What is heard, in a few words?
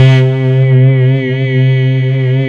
SYN K-2000, Roland